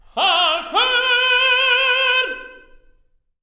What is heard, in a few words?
TEN. TRAD; Spectrasonics; Symphony of Voices